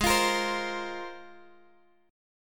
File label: G sharp 6th Add 9th G sharp Guitar Drop D